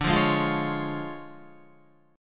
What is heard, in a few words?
D Minor Major 7th Flat 5th, down, Guitar; DmM7b5 strummed; JGuitar